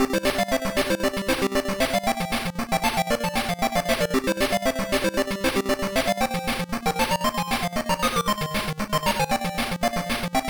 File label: Puzzle BGM; Pepe's Puzzles; Pico